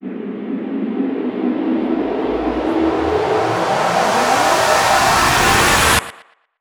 FX Uplifter

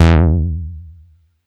Bass Juno E; Vengeance Samples

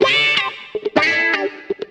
125 g, guitar licks; Vocal House; PS-28 Vocal House Samples; instrumentloops

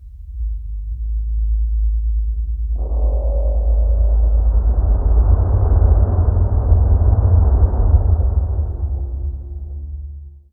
VFX Atmos

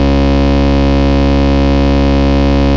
Vengeance Ultimate Bass C#, Root C#

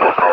LoFi Vocals Oneshots; Vengeance Samples

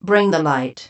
Vocal Phrases; Vengeance Samples; Vocals

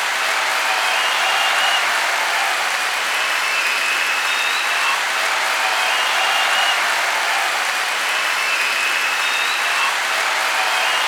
Rap FX Crowd Atmo, 140 BPM; Vengeance Samples; Vocal-Hook Kits; Song Kit

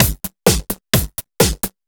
Housebeats 128BPM; Vengeance Samples

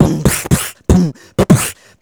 Beatbox Loops, Human Beatbox

